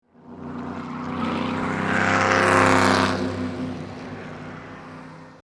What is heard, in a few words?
Car; Effetti sonori